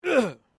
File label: MALE GRUNT; Male Vocal sounds; Grunts (Sound Effects:Voice)